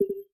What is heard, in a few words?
Video Record, ui